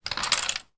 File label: household curtains open or close